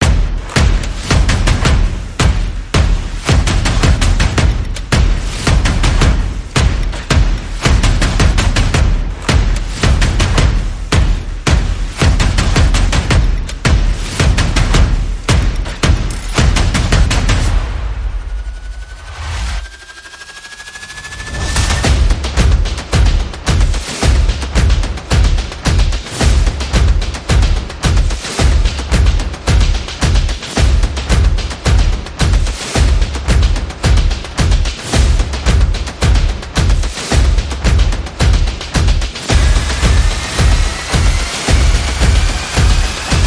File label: Percussion and Effects